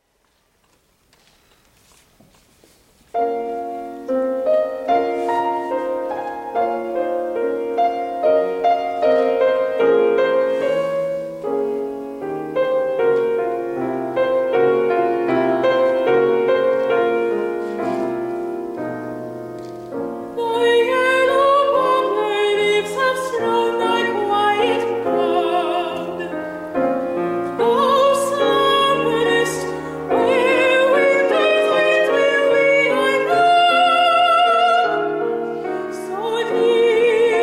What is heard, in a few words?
opera, english, art song, singing; [F] Autumn Evening - Quilter; [one of my favorites to sing]